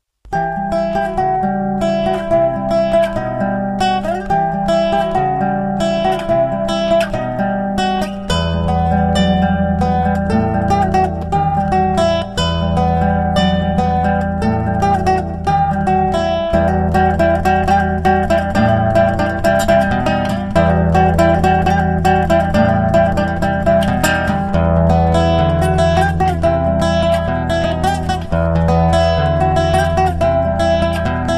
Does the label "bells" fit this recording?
No